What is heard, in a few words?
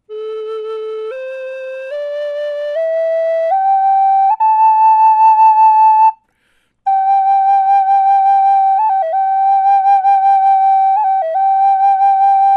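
natural, wood flute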